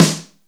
dry snare; DRUMS

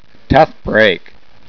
LOSE

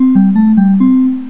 DINKLE

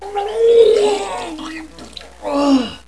PUKING

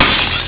GLASS

